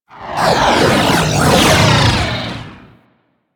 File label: Fighter Ship Attack Maneuver, Space Fighter Ship Engine; Vehicles; Warframe; Rail Jack; Rail Jack Enemy Ships; Corpus